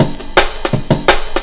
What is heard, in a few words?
breakz